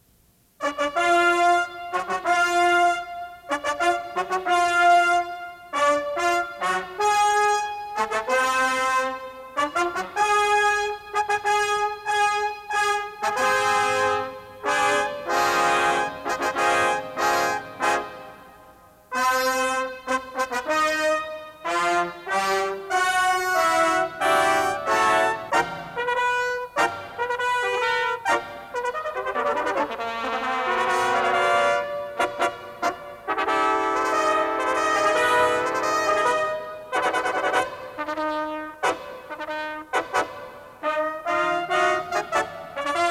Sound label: Festival Fanfare